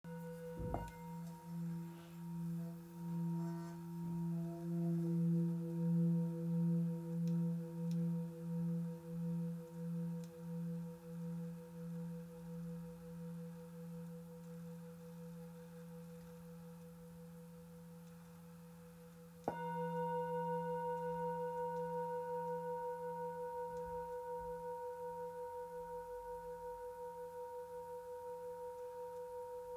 Bol therapeute mi

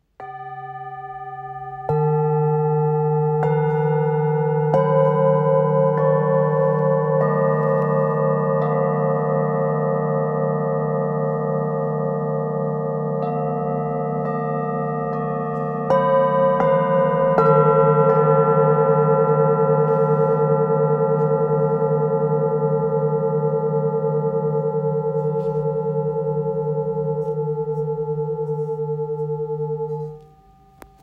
Set full moon crepuscule

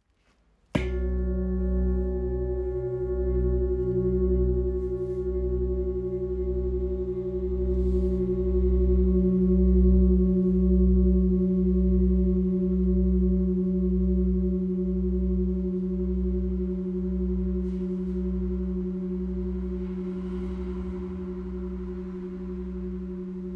Gros bol do 9,8kg

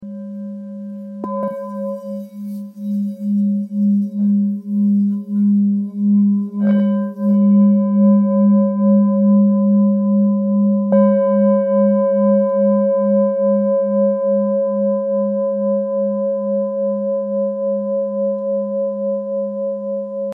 Bol ancien sol dièse 800g

